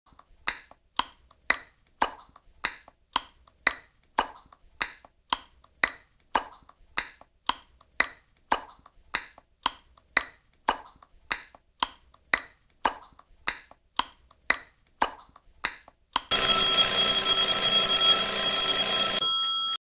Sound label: Alarm clock ticking; animation